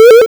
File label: rotate